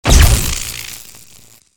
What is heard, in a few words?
capacitor discharge